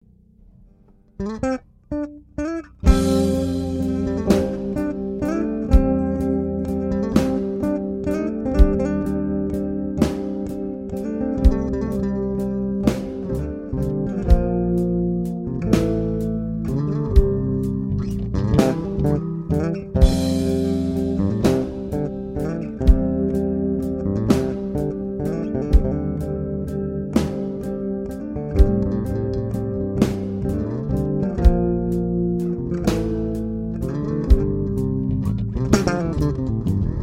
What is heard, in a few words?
bass